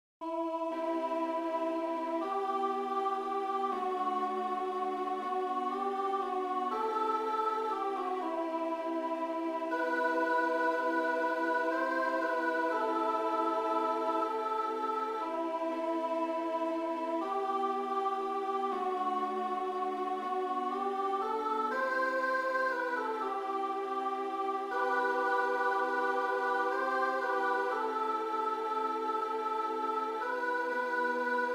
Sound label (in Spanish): misa I voces blancas; cordero cifrado